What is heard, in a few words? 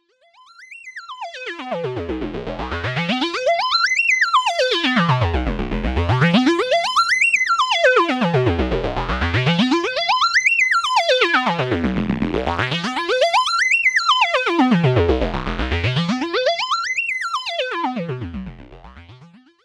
7 Octave Arp with Portamento